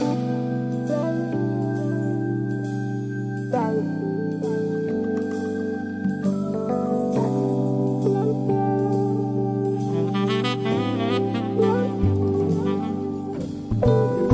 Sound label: 134 butter smooth hammond; MELODIC LOOPS; SAMPLED MUSIC LOOPS